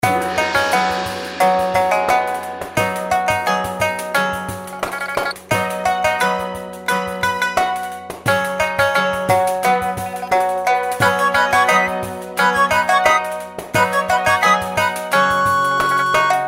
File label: reelspin bg; YuGong